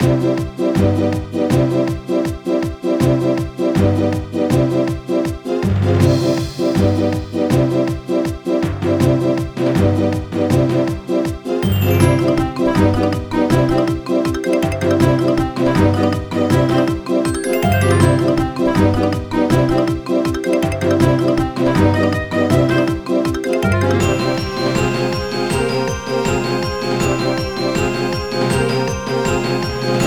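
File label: background music trial; melon bread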